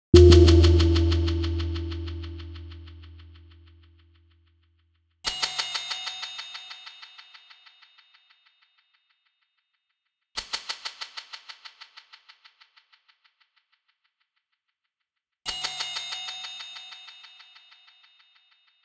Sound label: Camp Approach Perc; Firewatch